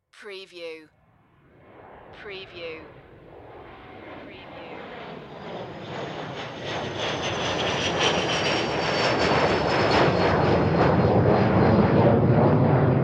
Airplane Jet Fly By

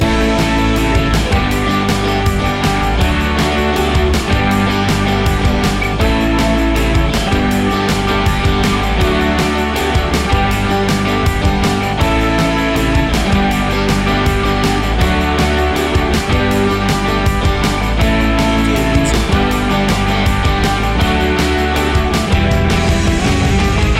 moving forward loop

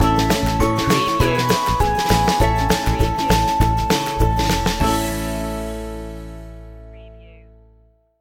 fun in the yard stinger

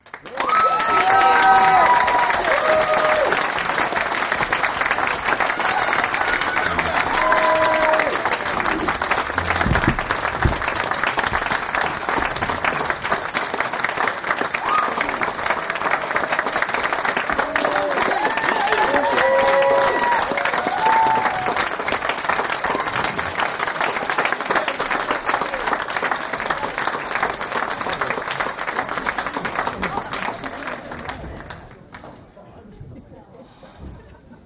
Audience Applause; beanstalk audio

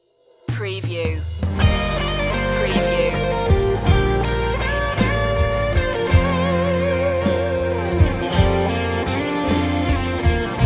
instrumental

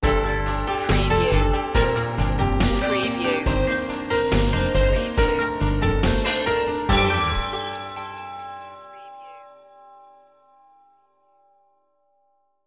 sting

